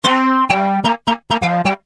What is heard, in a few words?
loop